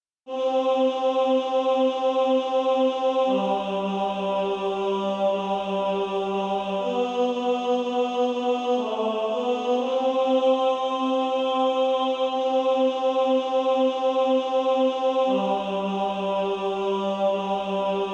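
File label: Female Choir, alto; Redeemed How I Love To Proclaim It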